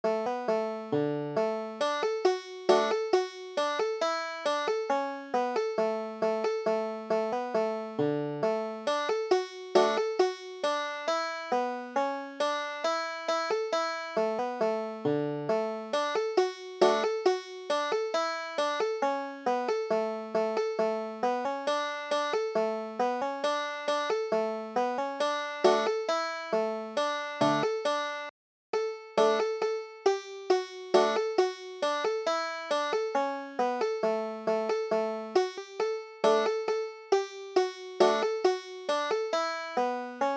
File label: banjo